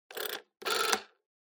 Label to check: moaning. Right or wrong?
Wrong